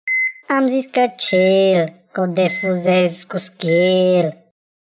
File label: sms; RoBotzi